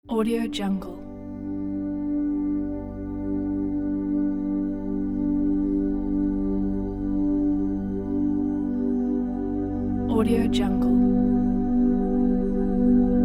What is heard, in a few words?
calming ambience soundscape